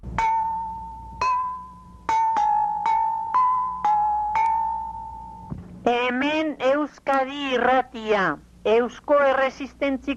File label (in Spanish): sintonia radio; euzkadi